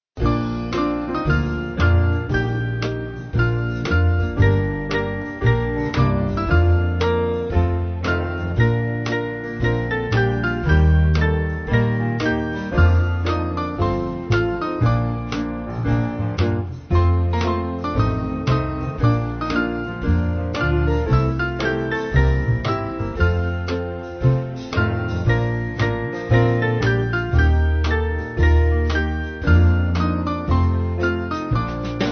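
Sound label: Band, Music Backing Files for Churches; Tell Me The Old Old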